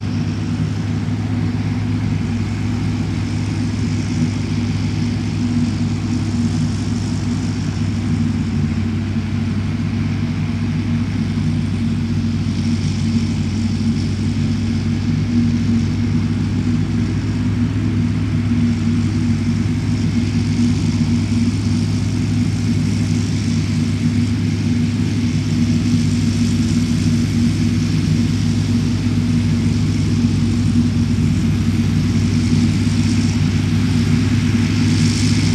industrial tractor operating close; martinimeniscus

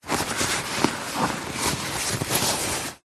luggage bag rucksack handling movement; zapsplat

